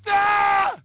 M scream; People

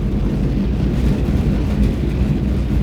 metal, Impact; Cars; XBOX

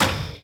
accept, UI

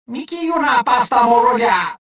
anger

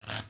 spark; ambience; Star Wars Jedi Knight II Jedi Outcast; XBOX

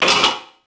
drape start; Max Payne; penthouse

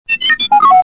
talk, r5d2

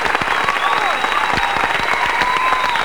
Folk, Scenery; Decors

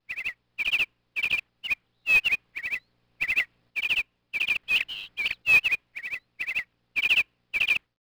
bird; Matt Hoffman's Pro BMX 2; XBOX

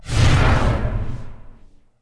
Force Push

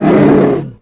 hit, creatures; wookiee; Star Wars Obi-Wan; XBOX

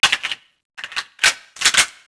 reload desert eagle; weapons; Max Payne

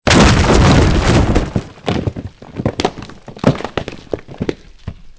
stones; Soldier Of Fortune II Double Helix; XBOX

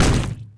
crane Arm hit body; impacts explos

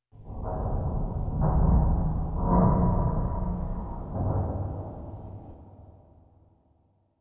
Metalic Rumble; Myst III Exile

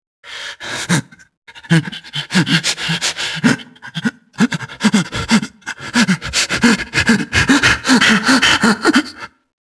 junkie noise; enemy; Max Payne

